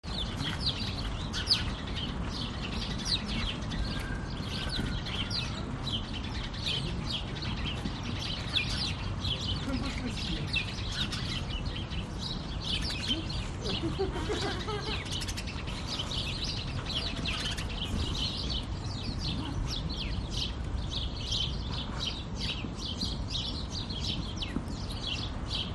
stockholm park skansen chirping sparrows footsteps on gravel walla